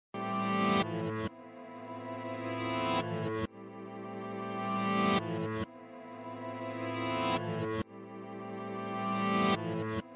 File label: Guitar Magic Riffs